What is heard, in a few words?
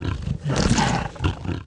Idle, Jaldari Mutant, Aliens